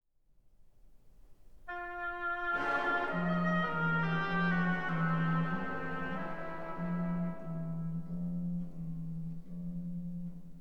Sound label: Symphony Orchestra; City of Birmingham Symphony Orchestra; 6 Orchestral Pieces Op. 6; 20th Century Classics; Innovators